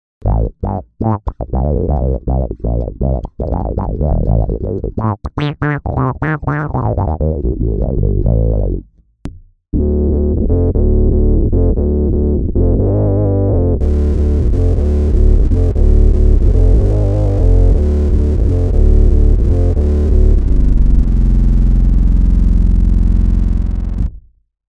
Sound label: Synths